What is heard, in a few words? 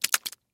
rock